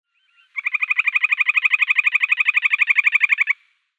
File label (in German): Meerstrandlaeufer Calidris maritima